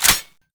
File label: bolt release, ak47